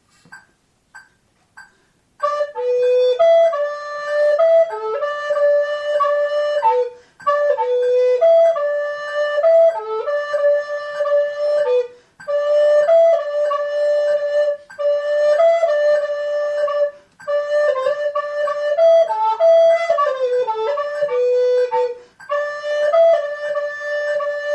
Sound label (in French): Gavotte, FLUTE; caroubel